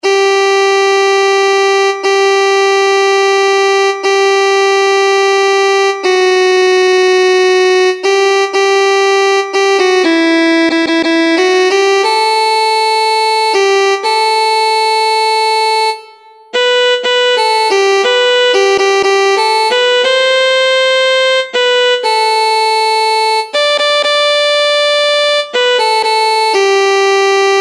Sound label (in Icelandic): sópran; barn